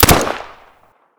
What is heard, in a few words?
makarov shoot; weapon